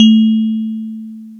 DX PIANO

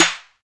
Snare, Alesis DM5

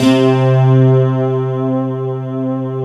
JD MIDI GT C3, JD GUITAR, Synth; Big Fish Audio; Synth City

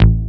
DR. LOOP, BASS; X-Static Goldmine 3

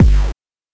BANK1 BD, NES DPCM; MIDINES

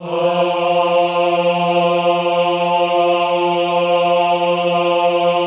AHH F2, Classical Choir; Best Service; Extended Classical Choir; AHH MIX

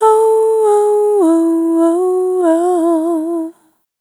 Dry, Single Voice Melodic Loops; Pocket Diva World Class Vocal Samples

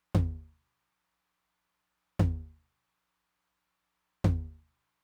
mid tom with, Roland TR-909; Roland TR-909 Ableton Project

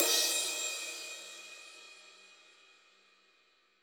CYM; Bob Clearmountain Drums I

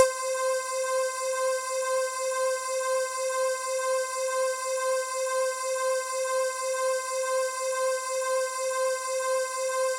Syn, Yamaha DTXpress misc voices; drum machine

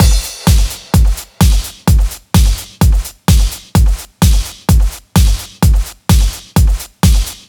Full Drums, 128bpm, Nu Dance Electro; Zero-G; Soundclash; Combi Loops; Pride Joy

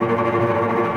CL TREM F, Orchestra; AKAI Advance Orchestra Upgrade 97 Vol.1

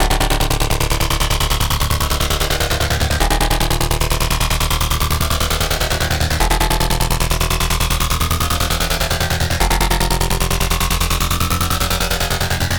aggro metallic pluck, bass

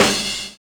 CRASHING; Univers Sons

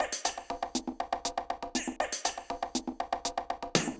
Beat Perc Mix, 120bpm, Old Skool House; Construction Kits; Big Fish Audio; OSH House of Amnesia

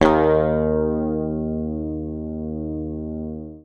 D#2, Bass Library, PICK; Sampleheads; Will Lee Bass Library

